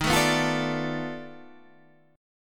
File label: B 7th Sharp 9th Flat 5th, guitar